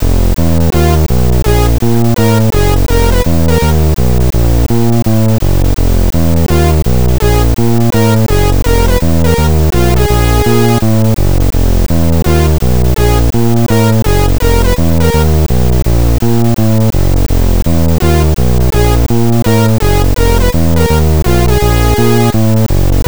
c64 jazz

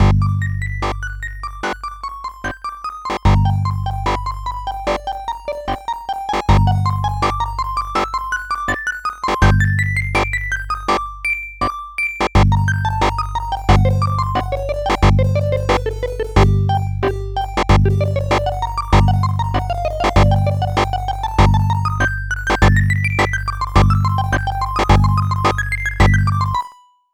gauntlet treasure room; arcade

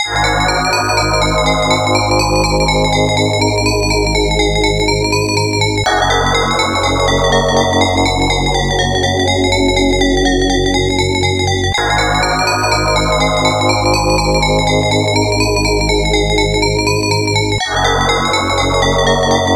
arcade secret room; bubble bobble

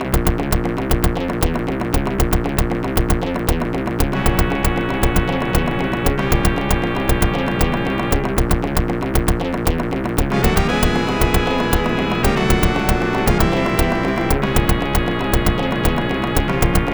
arcade; alien storm

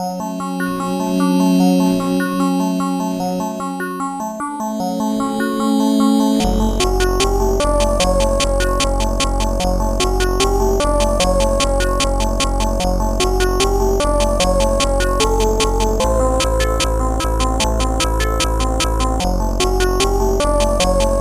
amiga in game; turrican iii

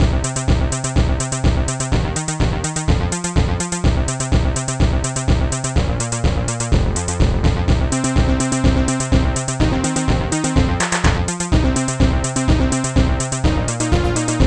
groovy; dual crew

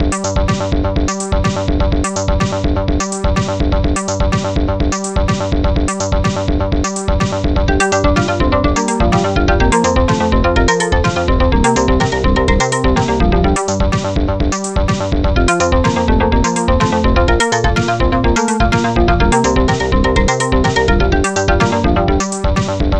loader; pure metal coders; hexion

